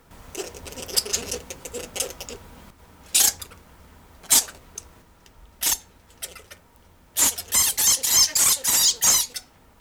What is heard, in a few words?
Rousettus aegyptiacus; Egyptian fruit bat; The anxiety calls in a group